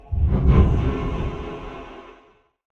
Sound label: fantasy game magic shadow instant cast spell